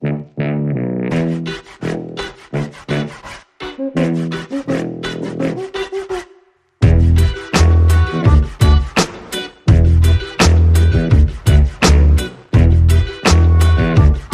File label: Latino